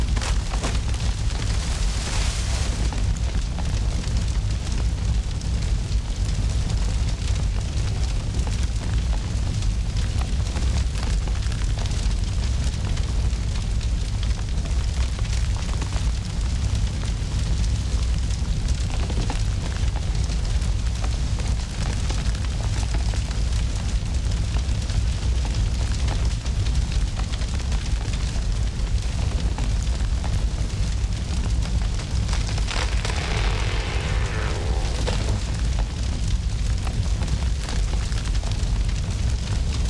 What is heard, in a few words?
brush fire, ambience; pf2e; hellbreakers